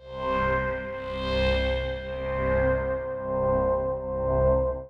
Reckless Flash effect

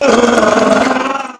death

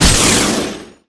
Charge Attack

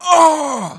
h pain

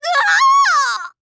death; Witchblade

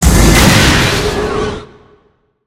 Whirlwind Blow effect

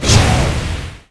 Skill Smash

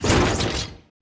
Robot idle

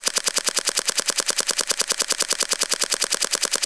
number rolling; UI

